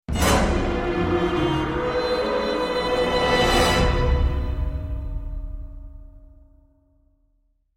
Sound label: death; hero of sparta; iphone